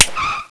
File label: Truck Hit!; Soldiers 2